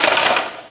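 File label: physics clipboard impact small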